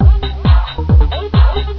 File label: club music; XDM; ambience